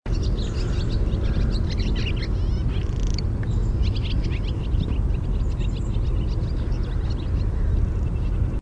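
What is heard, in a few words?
Barn Swallow song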